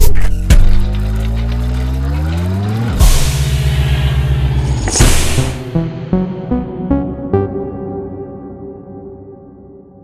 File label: Fountain Portal Activate; Going Under